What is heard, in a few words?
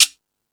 Latin Layers, drum machine